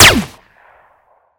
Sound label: weapon; Frontliner